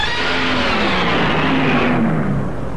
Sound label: roar; ultraman jirahs